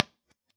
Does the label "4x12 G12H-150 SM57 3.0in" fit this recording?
Yes